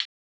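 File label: closed hi-hat; eddyflux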